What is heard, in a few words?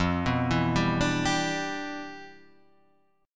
G 6th, F, chord; G6/F arpeggiated